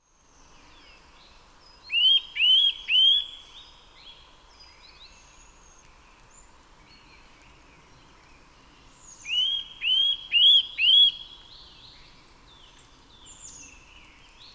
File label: Sitta europaea; SETE